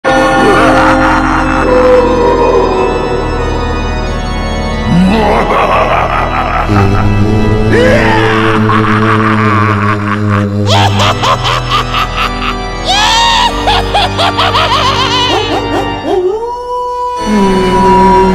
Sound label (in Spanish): SONIDOS PARA HALLOWEEN; PACK DE SONIDOS PARA HALLOWEEN